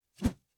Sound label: Swing; Gameplay